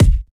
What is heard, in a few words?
Kick, Kickdrum; Equipped Music; Loungin House